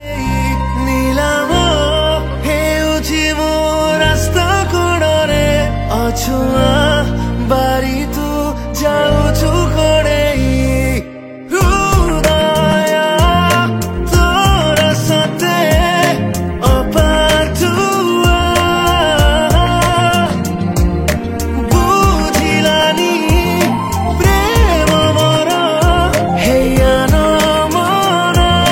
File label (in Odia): New Odia Song, Odia New Album Ringtone